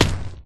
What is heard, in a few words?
falls big; Minecraft